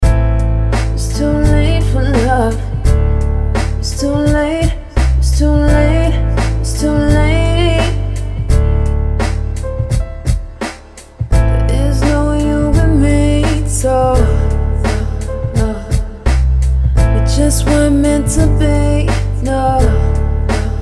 Vocal Hooks

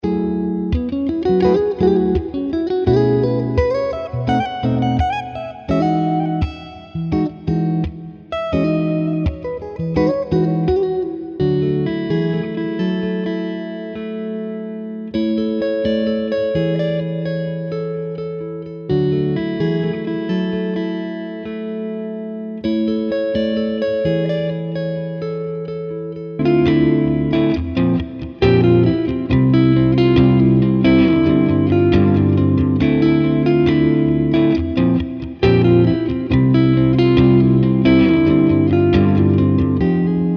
Lazy Days Chilled Guitars

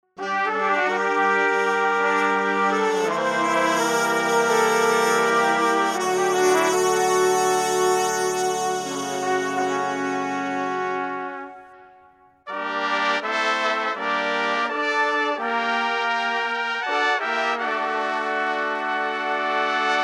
Hot Horns

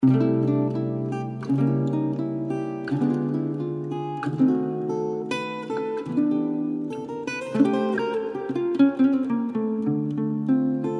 Gypsy Swing Guitars

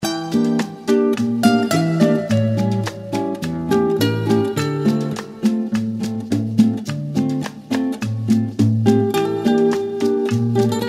Latin Guitar Grooves

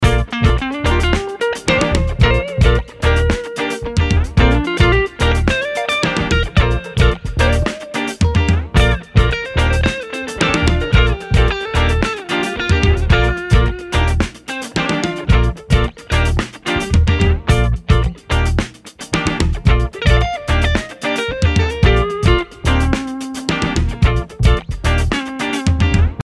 Guitar Bits n Bobs

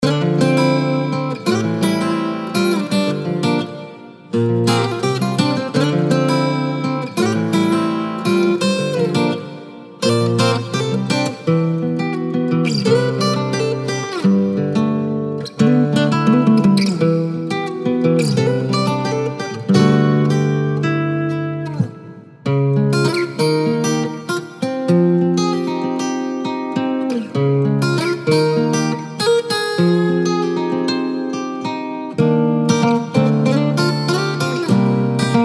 Acoustic Guitar Inspirations

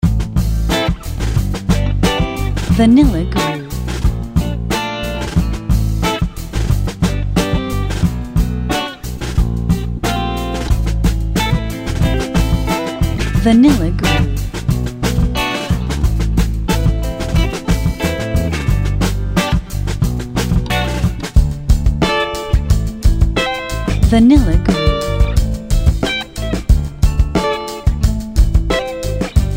Three Piece Hip Hop

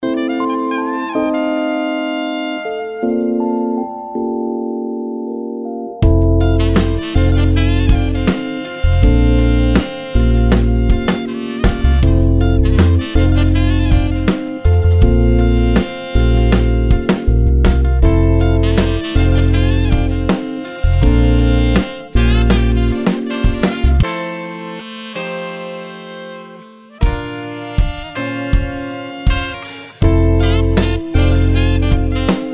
Sombre Sax

